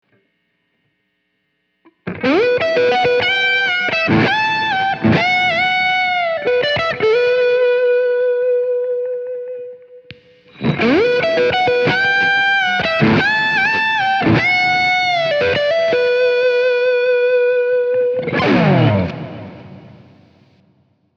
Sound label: neck thornbucker